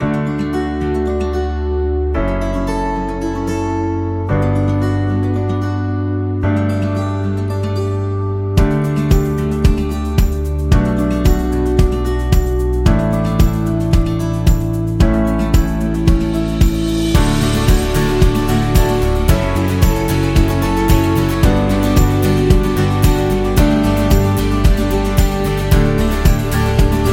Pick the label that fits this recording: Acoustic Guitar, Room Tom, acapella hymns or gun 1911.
Acoustic Guitar